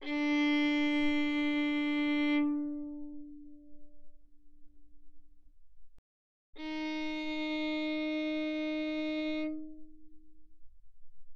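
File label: Violin arco mezzo forte sul D D4 B4; Strings; violin2012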